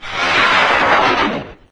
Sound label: die; doom3; archvile